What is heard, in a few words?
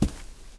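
player wood scrape; svencoop; sence; mistake coop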